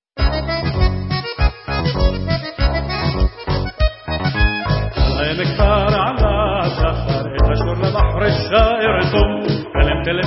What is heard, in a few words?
Debka VOCAL; La'emek